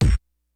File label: KICKS; Kid Nepro; Elite; KICKS SNARES